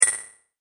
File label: multimedia rollover, Multimedia Rollover Tone; McKinney Sound